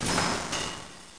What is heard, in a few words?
GLASS; SpaceOrb